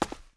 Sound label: concrete; firefight